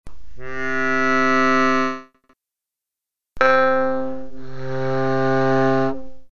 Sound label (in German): harmonika